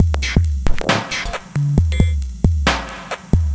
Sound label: Bpm135 Break, dubstep drum loops